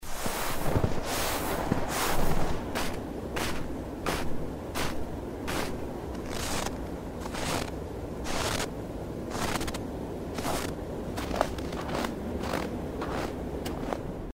shagi v viugu

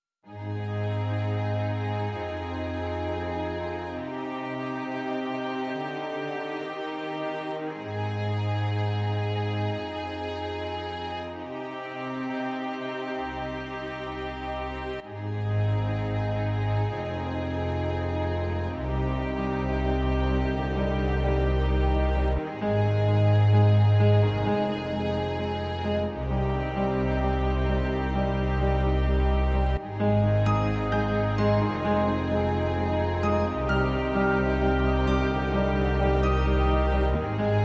tragicheskaya i grustnaya muzyka

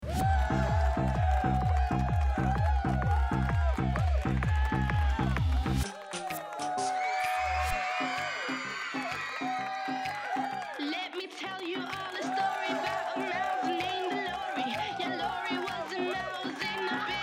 vecherinka; Звуки вечеринки с музыкой и голосами и криками людей в квартире и на улице

